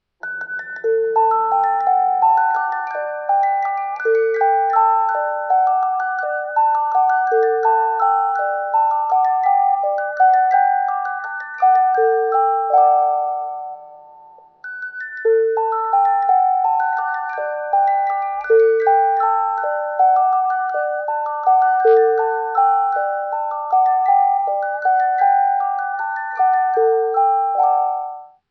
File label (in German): walzer; J. Brams